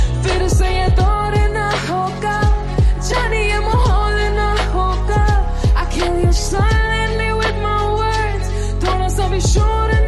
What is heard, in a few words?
Ringtone